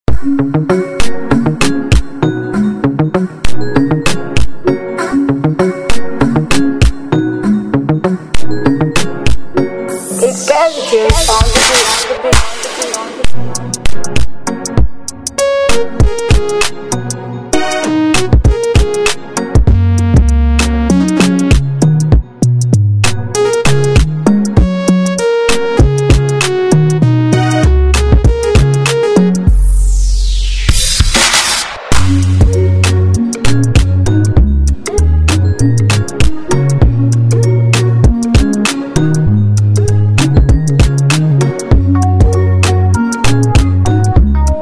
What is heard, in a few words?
Fresh Vibes Beat